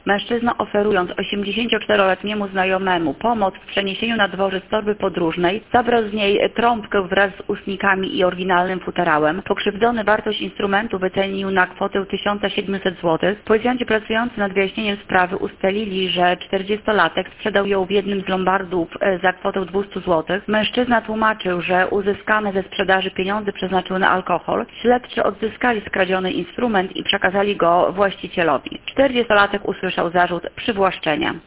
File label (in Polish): trabka